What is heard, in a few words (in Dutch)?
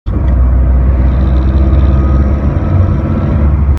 Bijgeluid 70 80km u